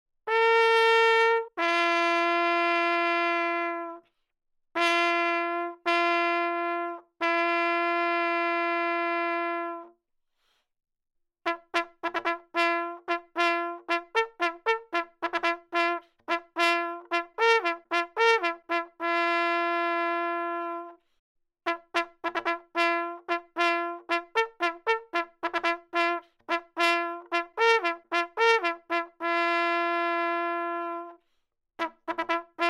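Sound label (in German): Begrüßung, Allgemeine Signale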